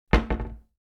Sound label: cabinet door close